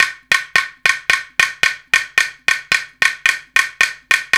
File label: 110 Hawaiian, World Dance Beats; Spectrasonics - Supreme Beats